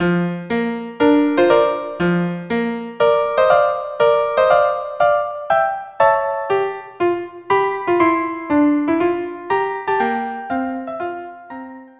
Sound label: March violin viola duo; Fidelio